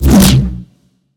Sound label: swing large; smite; god arthur